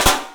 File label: PICSNF, 3D Drums, PICOLO FLAM; 3D Sound